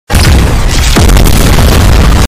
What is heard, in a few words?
jet dive